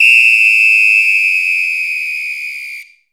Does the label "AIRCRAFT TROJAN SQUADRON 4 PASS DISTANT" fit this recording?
No